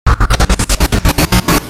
hit complex short

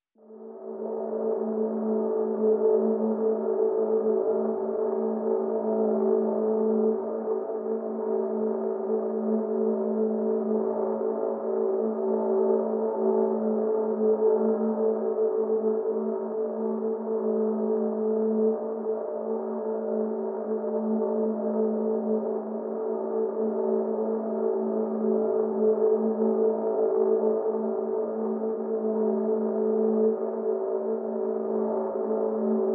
pads nerved a

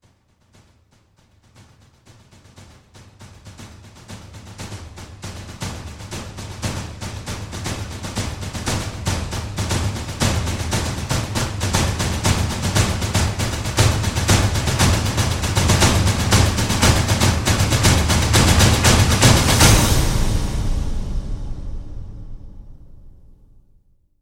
drums

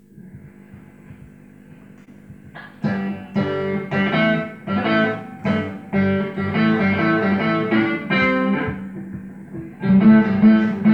guitar improvisations

